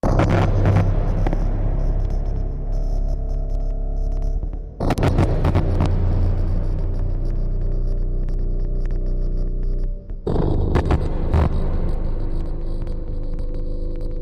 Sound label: Broken Down Machine Electrical